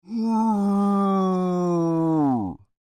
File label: Creature Moan Long, Human; Groaning; Voice; BigRoomSound